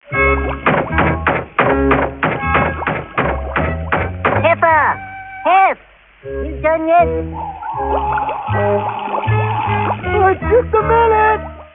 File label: toilet; heffer